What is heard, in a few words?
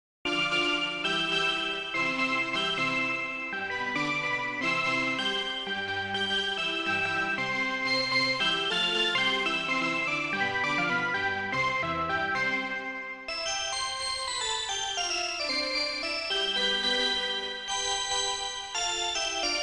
The Roland Jupiter 8